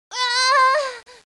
die girl

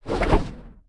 tentacle

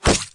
bolt

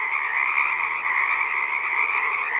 frog

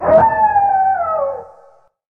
wolf

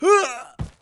die man

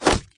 slingshot

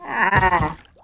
yak die

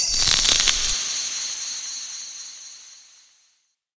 salt

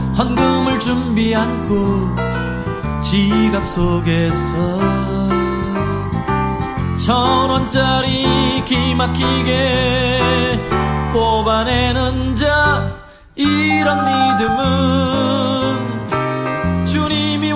hallelujah

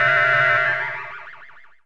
confuse

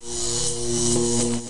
electric

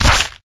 punch

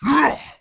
ogre

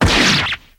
sword

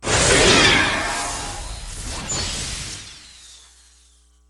spec sword

